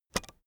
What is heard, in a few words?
keyboard external space release soft, computer